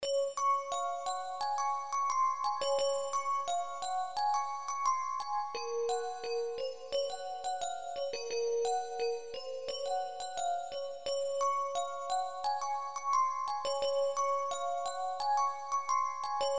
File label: Night time little bells (87bpm Db)